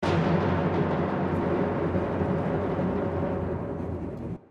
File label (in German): Trommels; Rheingold